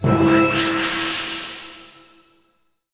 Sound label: Level Up; portal quest; android; Hero Level Up Add4